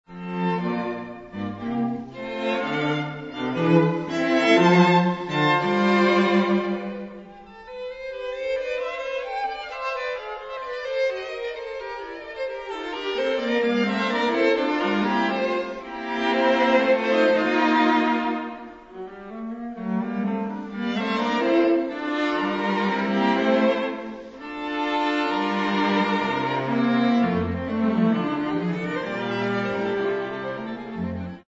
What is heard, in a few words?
piano quartet; g jenner